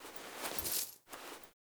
Coin Toss Foley; Level Events; Midrath

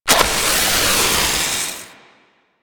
Proto Infested Maggot Spark Start; Warframe; Abilities

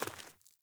Sandals Walk Rock, Footsteps; Characters; Player

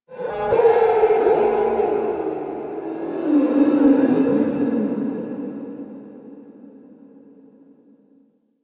Tech Texture Med, Ambience; Warframe; Cephalon Weave; DE

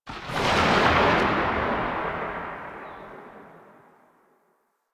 Gunbrella Tail, Weapons; Warframe; Tenno; Koumei

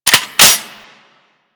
Lever Action Rifle Cock; Grineer

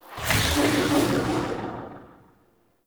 Decoy Deactivate; Powers; Fey; Saboteur

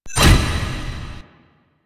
Stairs; Warframe; Entrati; Vaults

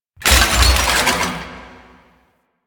Necramech Melee Death Fall; Enemies; Warframe

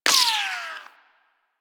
Bullet Impact Ricochet; Warframe; Weapons

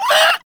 Infested Runner Pain; Warframe; Runners

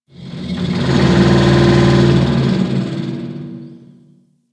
Landscape Coolant Pipe Groan; ambience; props; one offs; Warframe; Venus Open World

